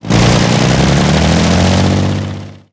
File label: pt76 engine load, engines tanks; War Thunder